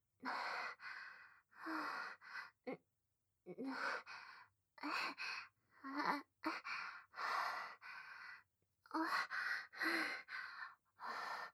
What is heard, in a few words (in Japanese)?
喘ぎ声